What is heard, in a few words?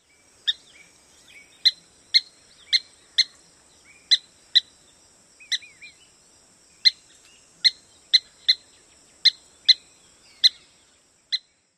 pik calls; TX